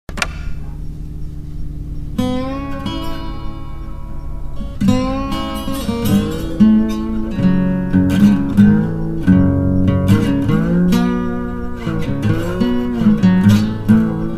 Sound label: Acoustic Blues